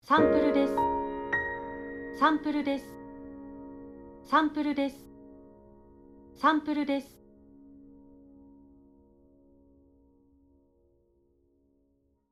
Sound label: Piano